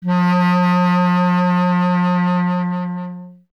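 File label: wind bass clarinet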